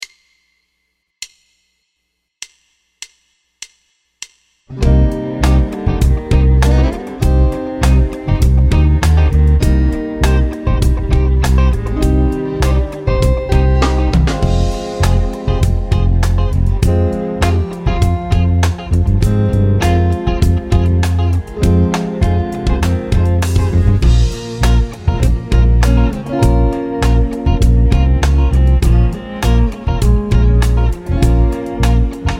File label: Cycle Minus Piano